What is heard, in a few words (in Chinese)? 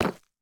深板岩砖 踩踏声